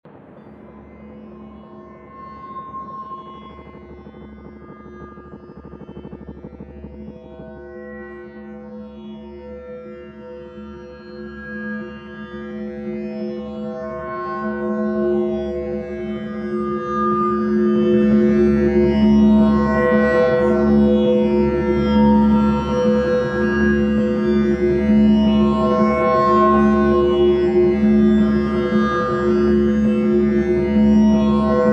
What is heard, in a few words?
Drone